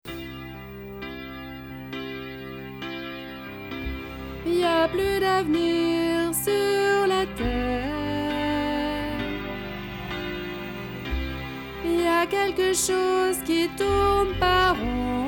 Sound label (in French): instrumental voix